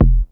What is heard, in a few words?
Bass Drum Club Techno Lite; Lost Tapes From Mars